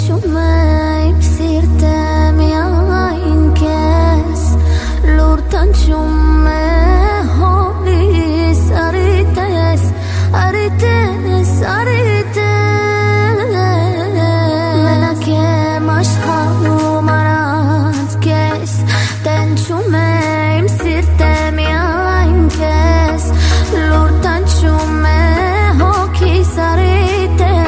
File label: Arabic ringtone